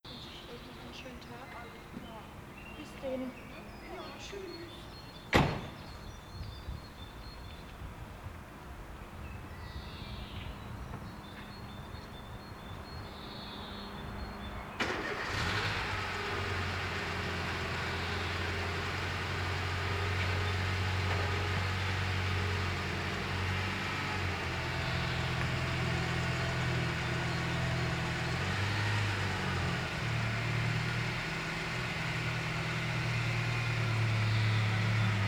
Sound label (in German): Strasse, Sennheiser MD 421; MarantzPMD661; Atmo / Straße